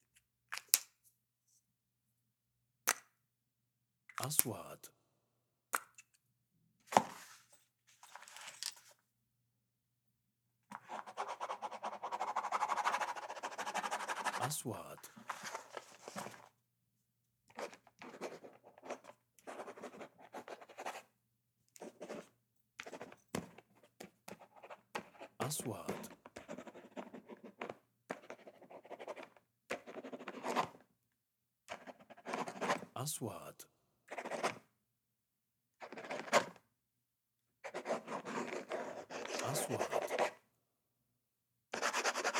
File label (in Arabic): فتح إغلاق ، قلم كتابة